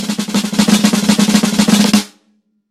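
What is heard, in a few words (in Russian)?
drob po malomu bystraya; baraban palochki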